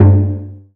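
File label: Malaysian PERCussion G1; USB Soundscan vol.60; Asian Traditions; AKAI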